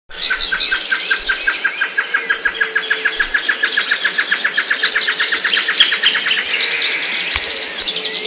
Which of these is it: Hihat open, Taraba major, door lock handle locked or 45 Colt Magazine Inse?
Taraba major